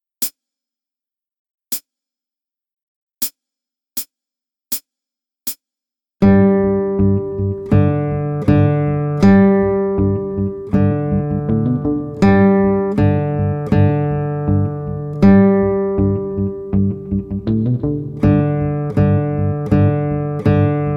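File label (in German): Saitenwechselübung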